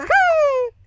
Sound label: haha; yoshi; sm64coopdx; char select extra chars